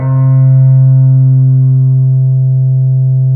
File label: zither C2, Fairlight CMI IIe; Keyboards; Keyboards2